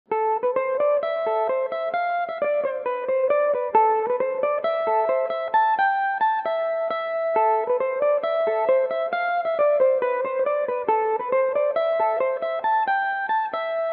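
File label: bourree A minor, irish mandolin, tunes; bourree entre la sacoche et la valise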